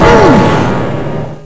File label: oof thud; kingarth